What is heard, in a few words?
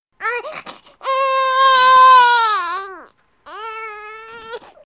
Baby infant crying